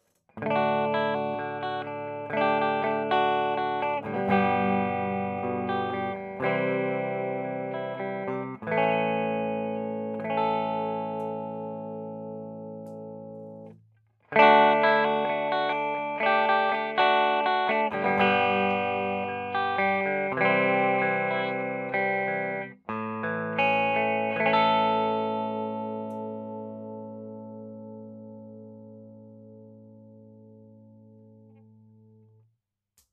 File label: es335 cln